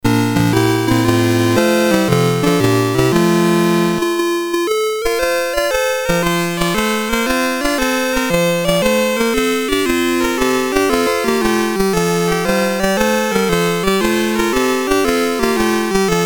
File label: Christmas Album BASIC, Commodore MOS6581R3; C64